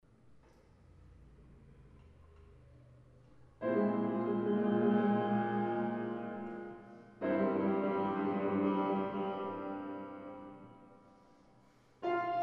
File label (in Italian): Un bel dì vedremo violino pianoforte; Casalborgone 2018; Magdalena Vasilescu; Giovanni Mattalia